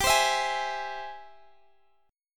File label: Ab Augmented Major 7th G, guitar